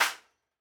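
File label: CLAP STACK HIGH HIGH CLAP STACK, analog recorded drum; Sonimus; s series drum pack; STONEQ 4K; SATSON; SATSON CS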